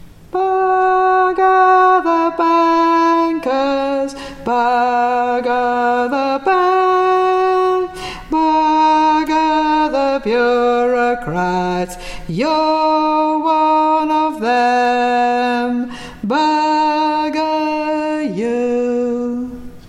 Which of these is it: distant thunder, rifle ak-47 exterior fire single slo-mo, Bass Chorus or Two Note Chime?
Bass Chorus